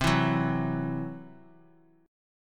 C Major Flat 5th C down Bass Standard; strummed; CMb5

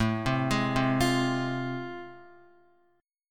A Minor Major 7th Sharp 5th A, Guitar; AmM7#5 arpeggiated; 4F,4A,5C,5G,5C,6E; x,0,0,1,0,1

